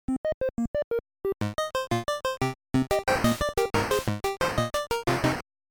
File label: Game Over